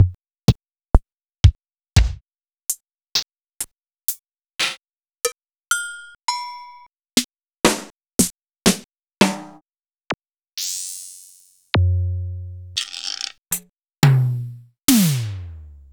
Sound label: Drum Kit Retro; Nuttkase